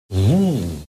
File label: Ball Expand; Sprite